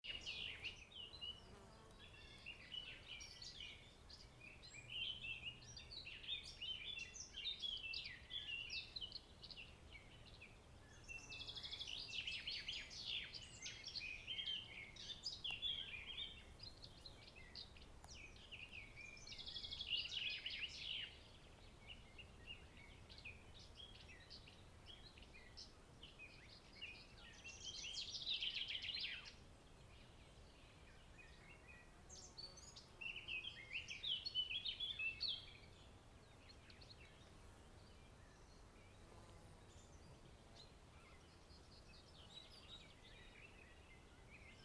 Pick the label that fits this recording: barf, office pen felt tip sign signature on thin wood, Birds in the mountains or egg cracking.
Birds in the mountains